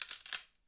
Palette Tear, Wooden Palette

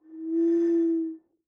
Bottle Blown

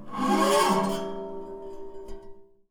Classical Guitar Scrape; Classical Guitar Extended Technique Stereo

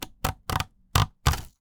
Polystyrene Creak

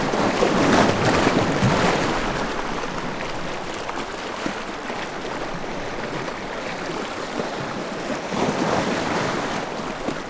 waves

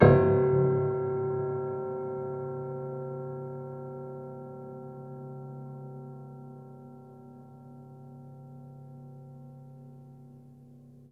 Harm Ped Low, Piano Harmonics

